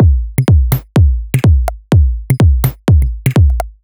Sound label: C 125bpm, House Drumloops; 90 s Sample CDs; Zero-G House Fabrik Drumloops; ZG Drumloops